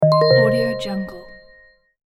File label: cancel button; appsounds